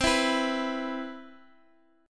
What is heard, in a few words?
C Major 7th, Guitar Open F; CM7 strummed; JGuitar